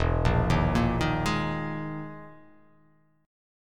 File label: E Major 7th Flat 5th down